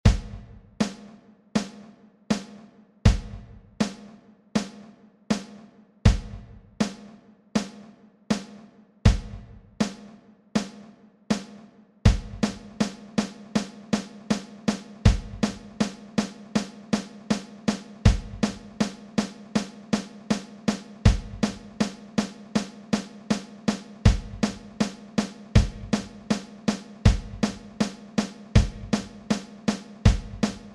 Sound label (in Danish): Enkle rock trommefills